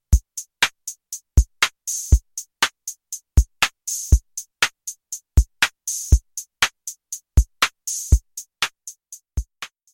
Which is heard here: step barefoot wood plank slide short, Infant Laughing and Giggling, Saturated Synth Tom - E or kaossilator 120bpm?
kaossilator 120bpm